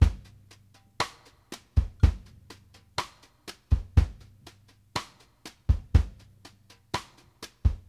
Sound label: STRGHT, BALLAD 60; Sampleheads; New York City Drumworks VOL-1; SP BALLAD 60